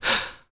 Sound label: FEM PERC, Vocal Jazz FX